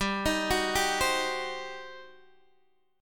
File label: G Major 7th Suspended 4th Sharp 5th G down Guitar DADGAD